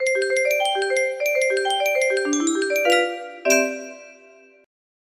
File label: windows 2000 bootup sound, music box